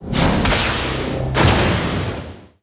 open; animas

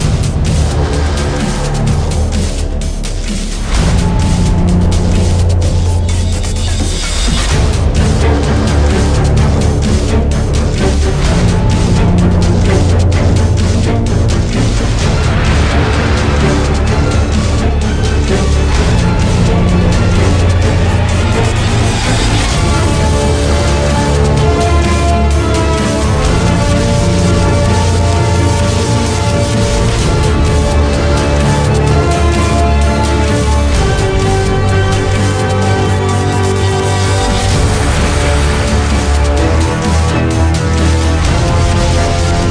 Boss Battle Music

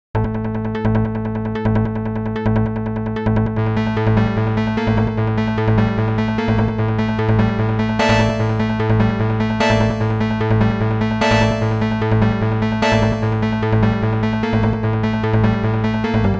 Boss BGM Stage Clear, arcade; Sega Arcade 80s Vol. 1; Bonus Stage Failed

